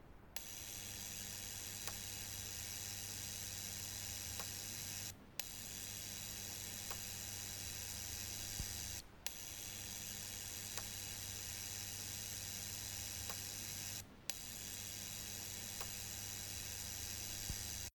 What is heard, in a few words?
Gresillements Sizzling